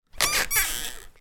household person sit on or get off squeaky chair

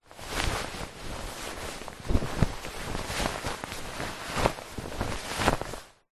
shirt handling; foley

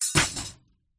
Metal Barrel Bolt Lock Drop; Sound Spark

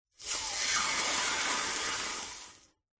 food lemon slide drop onto hot metal sizzle; zapsplat

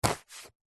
foley foam block dense stab sharp knife

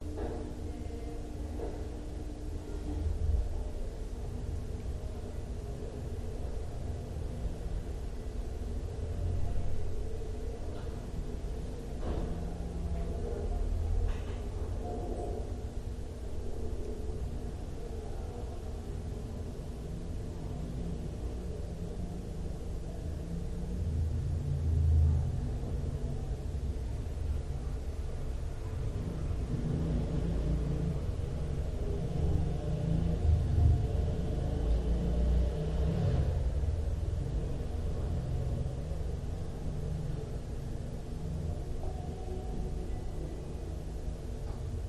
Apartment Roomtone Bedroom; your free sounds

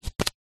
leisure playing card turn over on top of deck

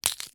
horror rip tear limb arm body part; zapsplat

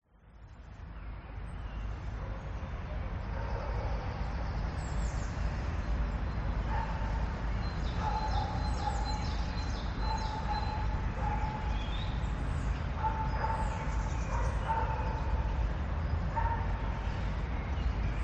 Early Morning Park Ambience; west wolf

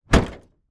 car trunk boot close; transportation

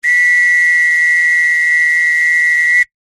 whistle two tone single long blow close up

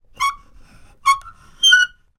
wood squeak turn; foley; zapsplat

